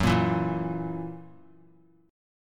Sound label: F sharp 7th, down; strummed; JGuitar